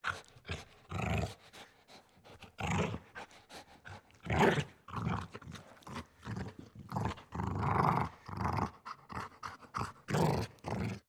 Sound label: INT Dog Growl; Coll Anderson; GDC Game Audio Bundle